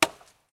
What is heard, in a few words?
UI Prop Axe